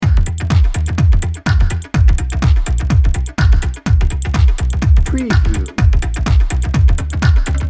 style house dnb loop

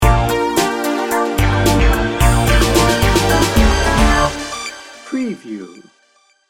bright and pulsing sting bumper

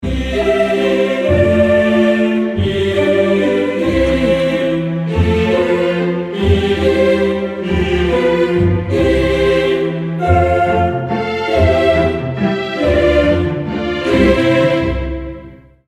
morning song

